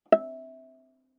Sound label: Viola pizz ff sul G E5; MIS Pitches - 2014; Strings